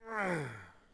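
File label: Swordsman Heal Vox; Civ4 warlords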